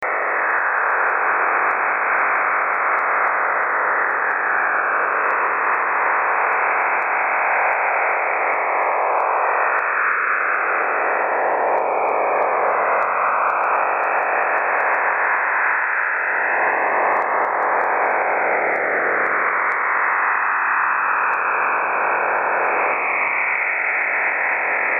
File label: usb; 7sunits